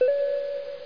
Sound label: Chime